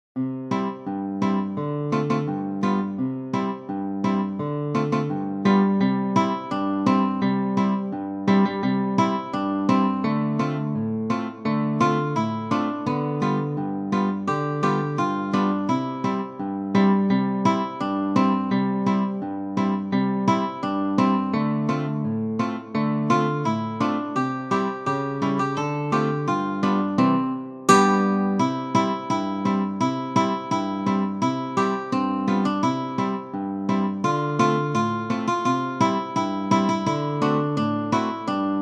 jingle bells; pierpont james

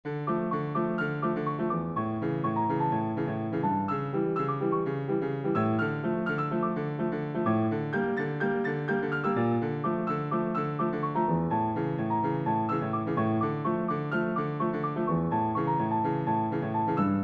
short piano piece; wyngaard kurt

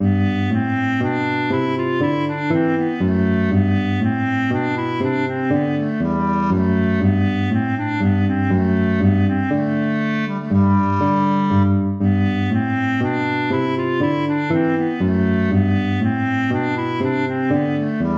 baroque minuet major

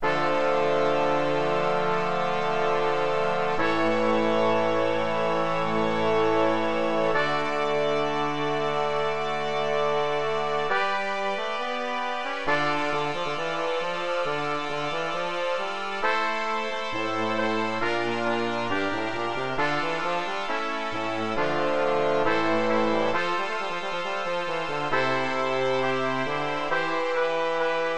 entrata organo grave

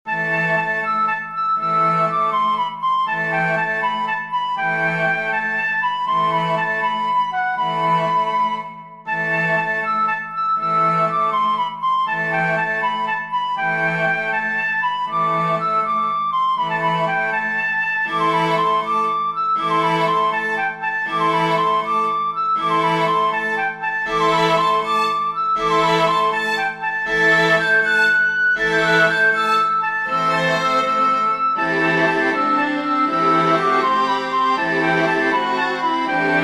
for flute strings; anonymous trotto

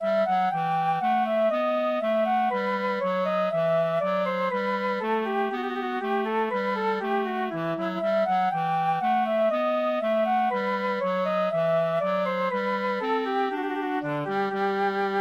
bourree for flute bass clarinet; bach johann sebastian

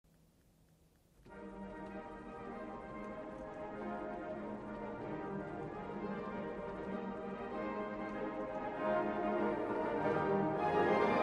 prelude organ transcription

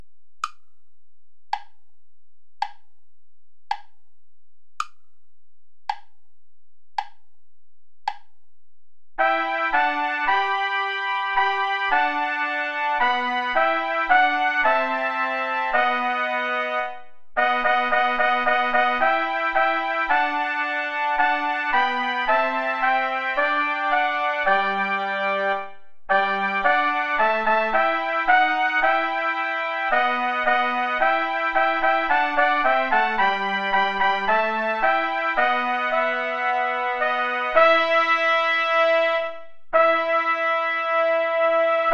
play along without trumpet; schutz heinrich; aller augen warten auf dich herre

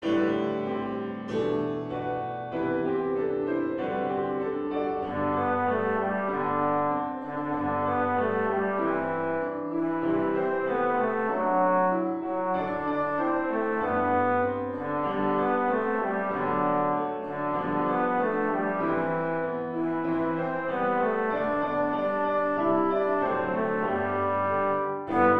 jingle bells vive le vent; pierpont james

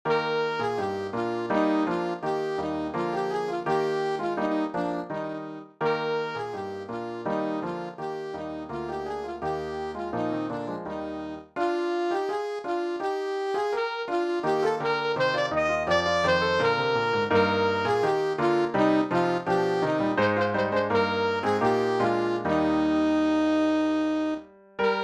deck the halls

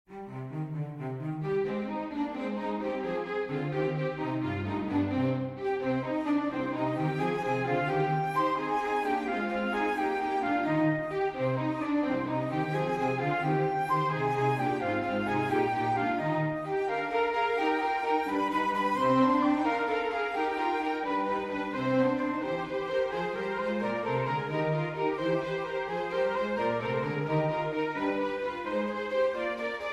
for flute strings; Schubert, Franz Peter

